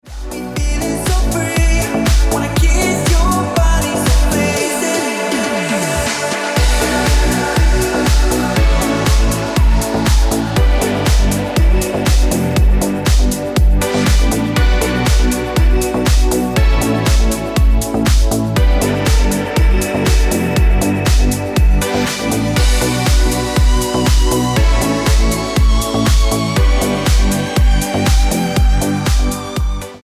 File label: Ringtone